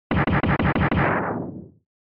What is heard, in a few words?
SNES Shooter Damage